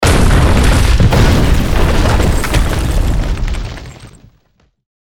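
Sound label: Ambience Bridge collapse; Godzilla Smash3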